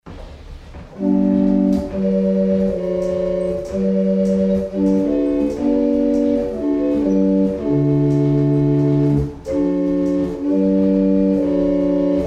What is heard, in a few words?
barrel organs; Boxford